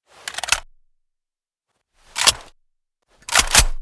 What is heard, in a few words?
generic reload, weapons; firearms